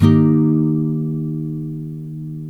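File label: NYLON D# MAJOR DOWN, CHORDS, Guitars; Spectrasonics; Hans Zimmer Guitars VOL-1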